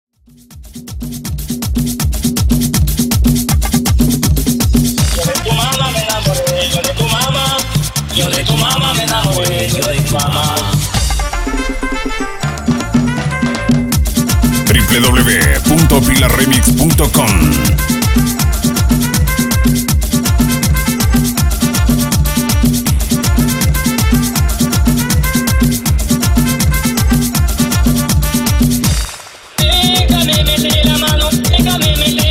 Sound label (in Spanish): 170 Bpm Reedit Instrumental; Bomba Sin Animacion